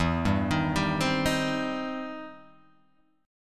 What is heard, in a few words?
E Minor Major 7th Sharp 5th E down